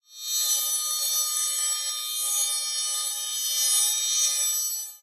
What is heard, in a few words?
metal chime ring reversed high pitch; zapsplat